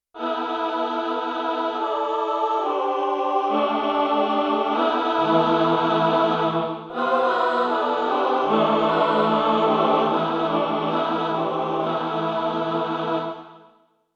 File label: 4TH STACK, Choir